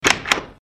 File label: door open